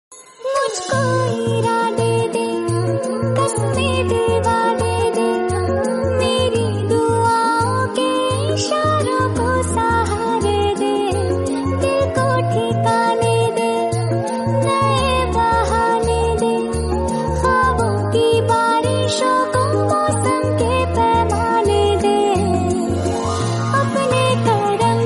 Female Version; Aashiqui 2

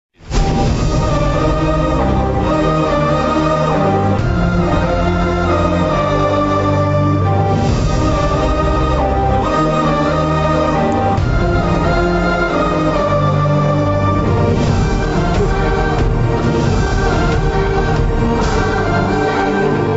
sad ringtone; seetimaarr movie

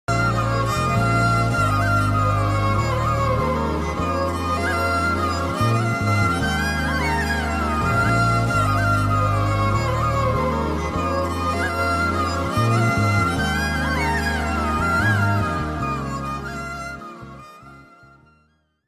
hindi movie background song, love story bgm; Jaanu

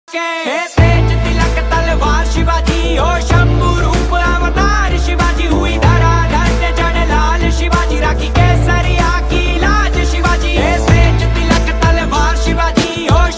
ringtone instrumental ringtone; shoorveer

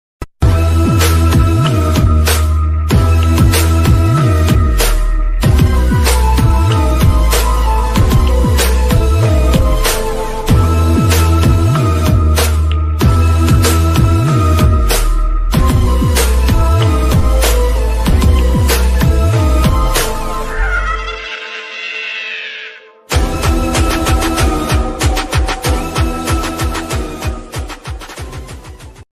horror ringtone ghost ringtone; virana movie

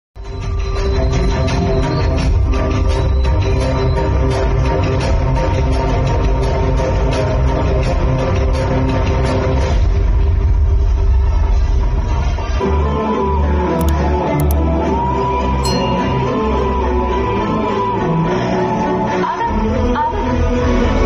sad bgm ringtone; baby movie

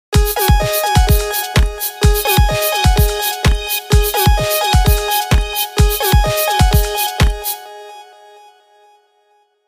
disco house ringtone

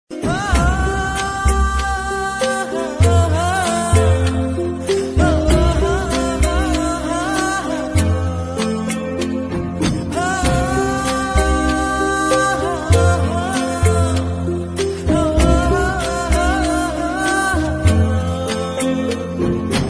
love bgm ringtone song bgm; winner

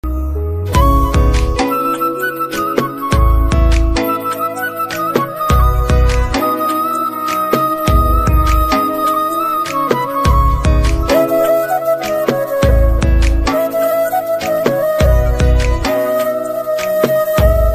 flute cover, flute ringtone

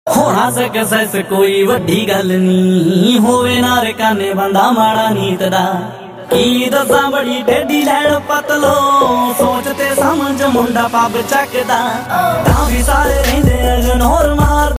mobile ringtone new punjabi song

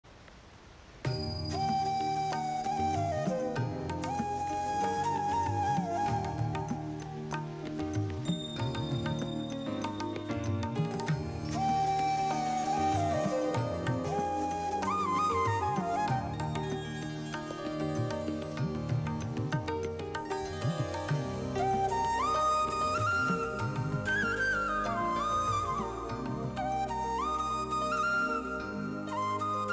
background flute

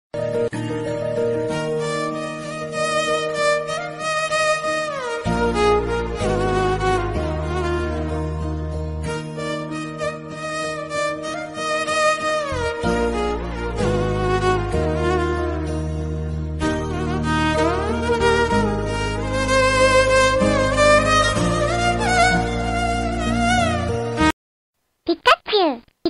instrumental bgm

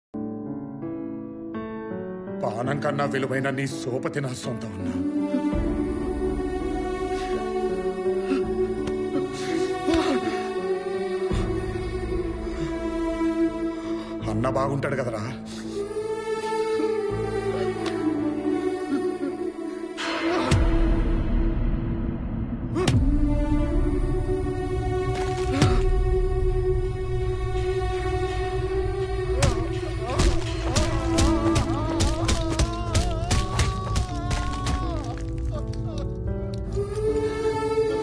emotional sad bgm telugu ringtones; rrr; ntr

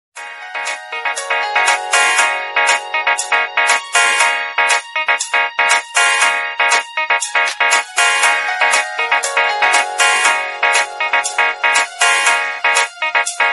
funny keypad mobile ringtone

